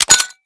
pinpull; deploy; Valorant; raze

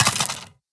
magdrop, weapons; cstrike; valorant; singurality phantom

